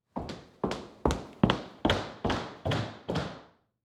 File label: Foley Footstep Cowboy Boots Running Between Surfaces; Foley Footsteps